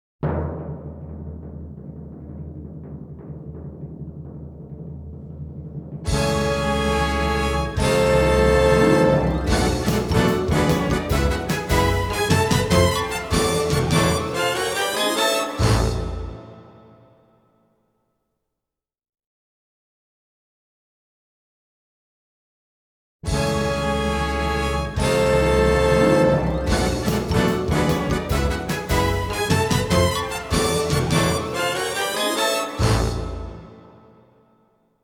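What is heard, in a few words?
Fanfare - Instrumental; Network Production Music 110 You're The Star! (Multimedia)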